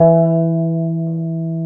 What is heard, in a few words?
NASAL BAS, Electric Basses; PlatinumPhattCD2; E-MU Producer Series Vol. 8 – Platinum Phatt